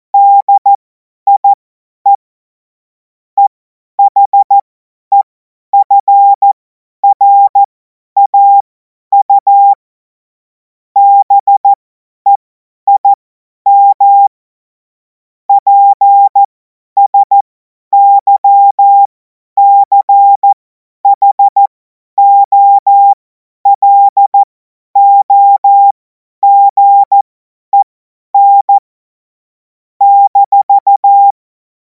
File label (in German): Witz, Morsekurs, cw morsen; hamradio; cw witze